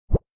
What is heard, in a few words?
close